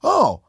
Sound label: "ho", ooh ahh; Voice; aadam conover